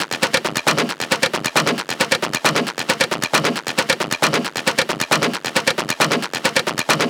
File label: Perc Loop 135, Techno; Loops; Drums; Ghosthack; AC2024 - Day 1 - Techno Sounds 2025; Percussion